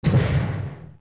Cage Door Stop; Fire Temple

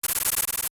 Cucco Fly; Cucco fluttering (also Keese, Vultures, and the Transportation Duck); LTTP

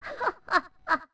Old Lady Thankful Laugh; Old Lady thankful for Link's help (both "Thankful" sounds are also used by Granny, Anju's grandmother)

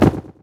Gallop; Lord Bullbo; Eldin

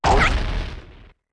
Deku Link Launch; Launching from a Deku Flower (four different shouts)

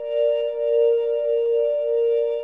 After Boss Glow

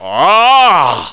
Ahh; Master Smith; Zelda

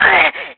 Shock Burn; getting shocked or burned; Link

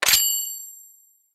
Boomerang Lock; Gale Boomerang

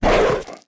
Wolf Charge Attack; Wolf Link unleashing a charged attack (2 sounds in various pitches)

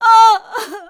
Blacksmiths Wife Cry; Blacksmith's Wife crying

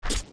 Steps Grass Jump; Jumping and landing on Grass (young); zelda; OOT

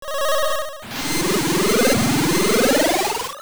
Time Warp Begin; A time-warp in OOA; Zelda Oracle

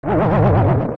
Stun; Bigocto; zelda; OOT

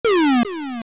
Fall; A thrown object falling down a hole (also used for some enemies jumping); Zelda

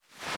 Dismount; Link dismounting Epona

